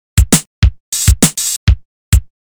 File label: depressing rock music